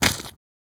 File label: run gravel